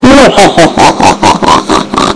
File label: muhehehe